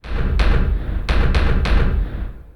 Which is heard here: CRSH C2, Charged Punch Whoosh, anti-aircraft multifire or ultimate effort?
anti-aircraft multifire